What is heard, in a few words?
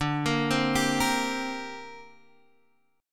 D 7th Suspended 4th Sharp 5th, down, Guitar, Half Step Down